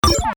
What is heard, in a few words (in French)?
reponse; Mobiclic51